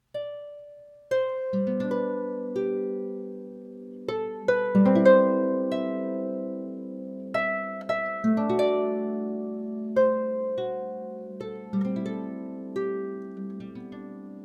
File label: harp